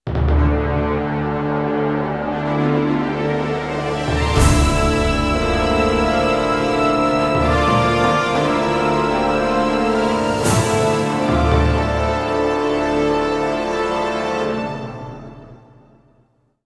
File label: Human Lose; Kingdom Under Fire